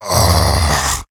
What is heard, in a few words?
Mummy Zombie Attack